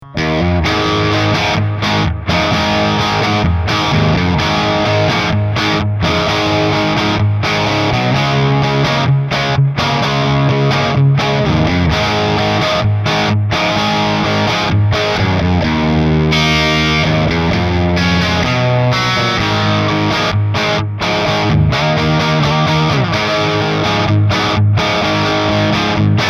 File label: Rock; iRig UA